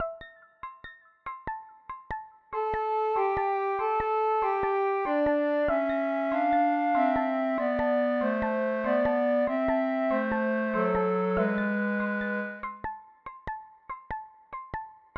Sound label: Game over jingle